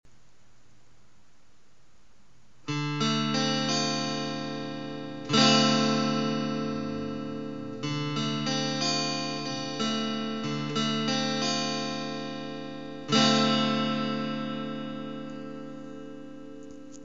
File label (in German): d-moll, guitar